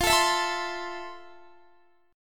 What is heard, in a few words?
F Major 7th, Guitar; FM7; x,20,19,21,21,19; 4E,4A,5D,5G,5C,6F; strummed